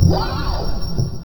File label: Wow Slide